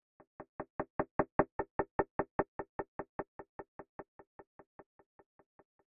fx knock knock

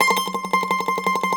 MANDOLIN

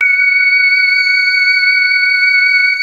METAL VOICE; Wizoo - Powered Wave

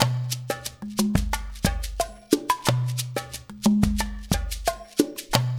LOOP, Percussion; InVision Interactive; GRUNT

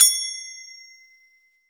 FINGER CYM

